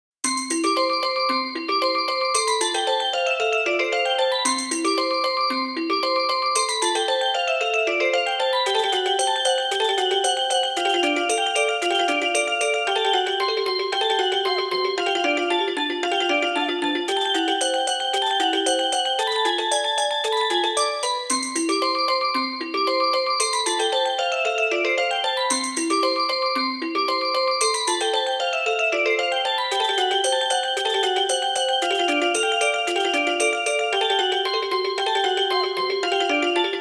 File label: Cembalo Rumba; savage reign anthology arrange sound trax gamerip; Carol Ending